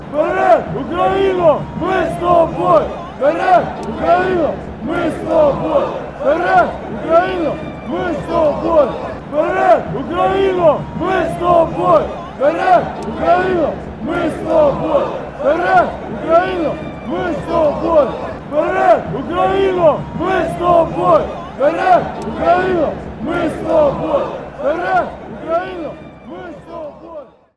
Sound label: chant, fanchants